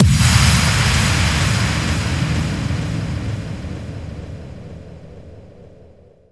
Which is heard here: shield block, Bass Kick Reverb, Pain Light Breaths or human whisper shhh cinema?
Bass Kick Reverb